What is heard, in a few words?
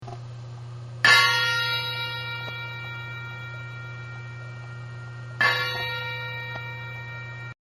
tone ring, banjo